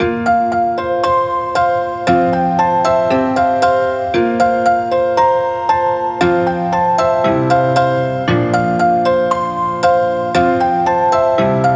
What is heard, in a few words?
Catchy Melody Great Feeling 116BPM, piano